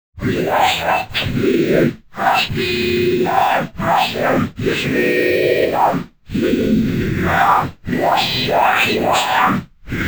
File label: futuristic angry, cyborg; wall e